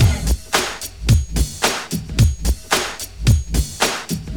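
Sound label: new jack swing, funky drum loops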